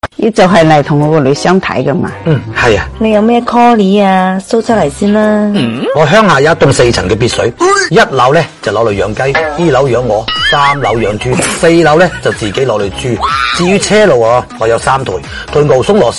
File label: laugh; breadradio